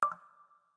Lock

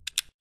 screen shot, ui; Doov A8

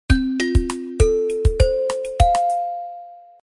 notification; Gionee; GN206

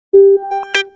Wahwah; Sony Ericsson W350i; ringtones

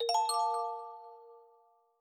heal; Smartisan OS 6.0.0; notifications

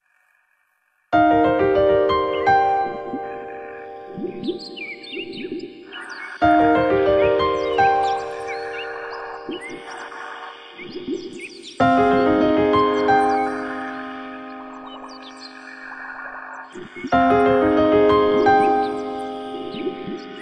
Floating, alarms

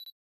camera focus; FCNT Arrows Alpha

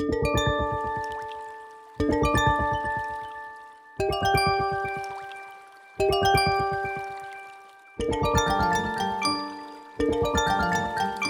Water Song, ringtones; Honor Pad X7

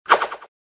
Archery, notifications

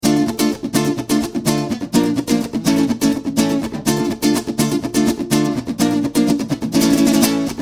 Fast, ringtones; Explay; Neo

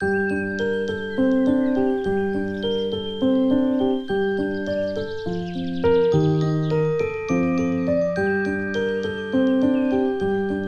Chasing Wind, alarms; Honor Pad X7

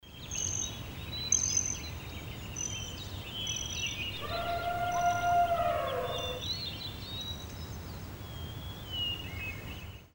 Tropical; Oppo Find X903; notifications

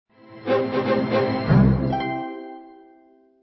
Tone; SkyBee; 60AL; SMS

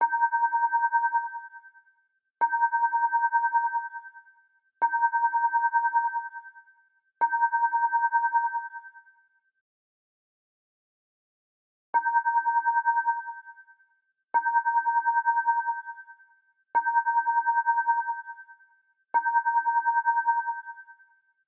Simple Bell; LG G4 H815; ringtones

